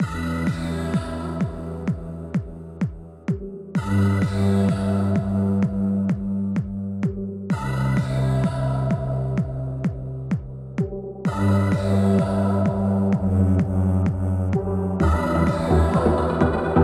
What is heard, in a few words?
New Trance Track